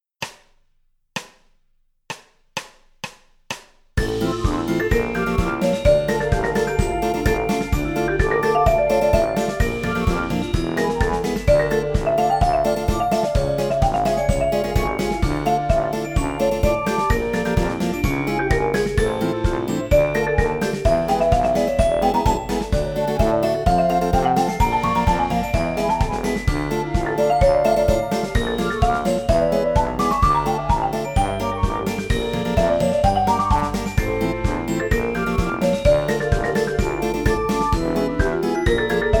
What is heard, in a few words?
Marimba